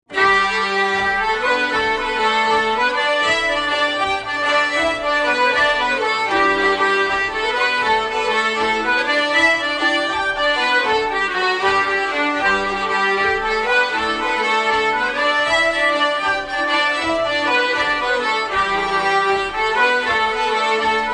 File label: Jig; Kesh